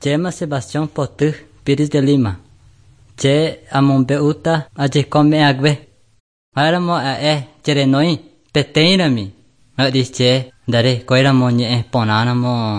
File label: songs guarani mbya; bible